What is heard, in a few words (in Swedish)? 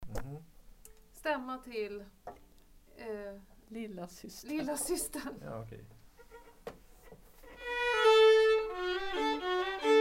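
Fiolstämma lillasystern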